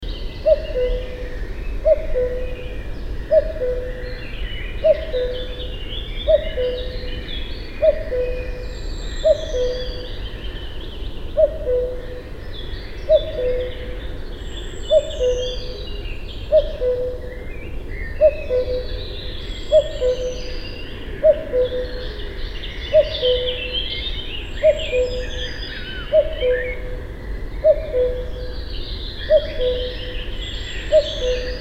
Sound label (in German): Kuckuck; Vogel